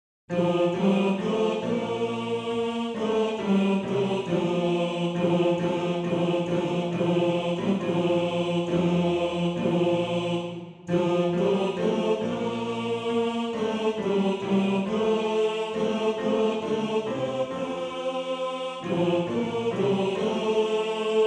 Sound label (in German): Bass; Bass 1